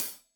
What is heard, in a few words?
Hat Tight, Hi-Hat, Cymbals; Deeply Sampled Drums; Stereo